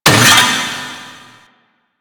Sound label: Vaults Stake Gate; Warframe; Entrati